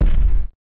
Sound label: drum